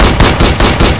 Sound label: 50cal loop